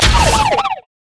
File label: dash; Vi